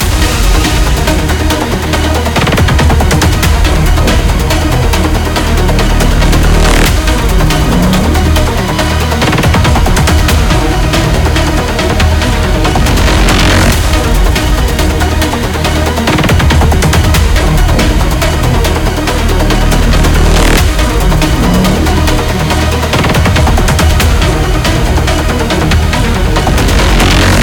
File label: Biome 3 Idris Shootmain Loop; Voidbreaker; ps5 windows xbox series xs